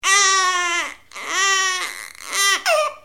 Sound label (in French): Bébé, bruitage; Bébés